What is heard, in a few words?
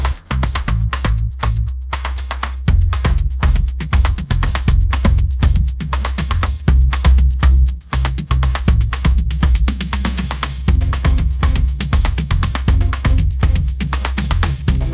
upbeat pop melody, ringtones